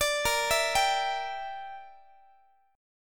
A sharp 6th Flat 5th A sharp down Guitar 4A,4E,5A,5D,5G sharp,6C sharp, chordshape; A#6b5; arpeggiated